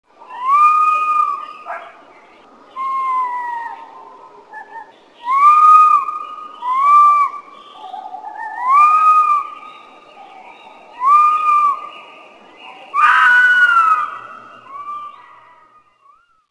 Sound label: DOGS